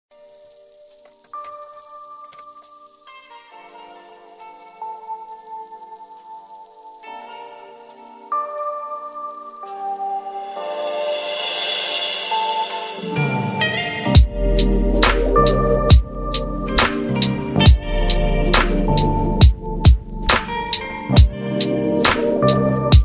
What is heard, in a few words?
concrete; goosetaf